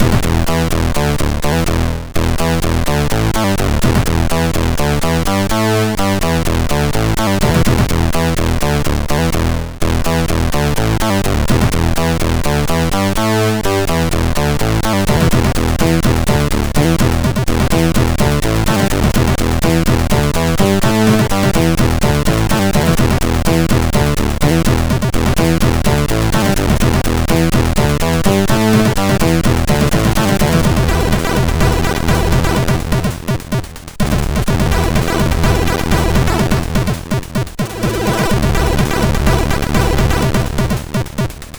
sid MOS6581R4; Killing Rules 100

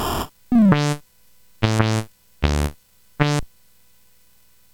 Ducks Ahoy, CSG8580R5

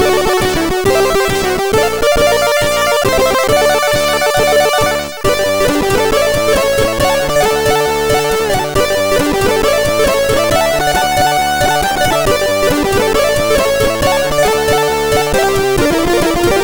Fanfare, MOS6581R3; Chrono Trigger

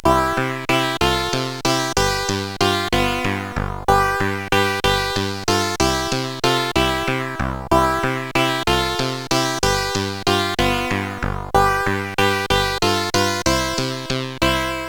Alpen-Style, sid CSG8580R5

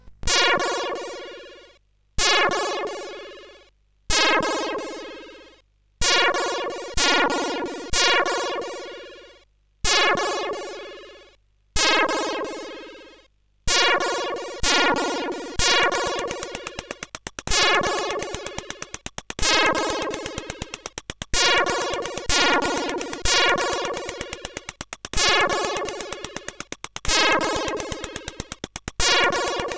Noise, sid CSG8580R5

